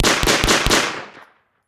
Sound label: Submachine Gun MP40